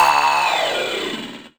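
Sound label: synth, Production Effects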